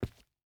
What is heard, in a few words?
footstep boots concrete walk; Rust